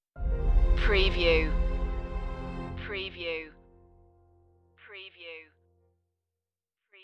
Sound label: falling angels stinger